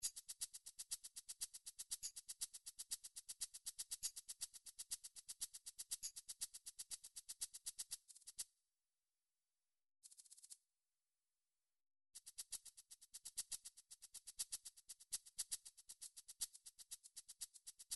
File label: midi drums percussion shakers; midi vol 4 drums percussion